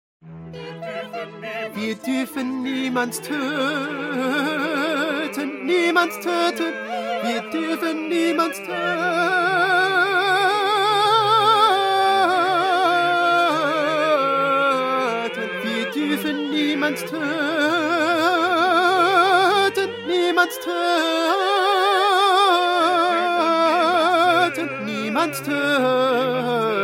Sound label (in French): petit choeur tenors